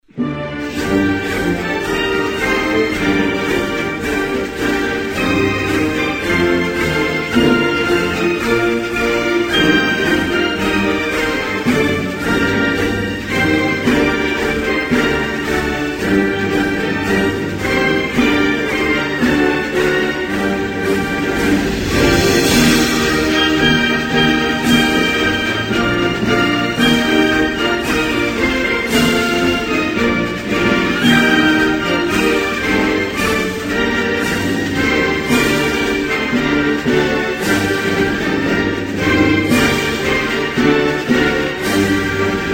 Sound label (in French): Roumanie, Hymnes nationaux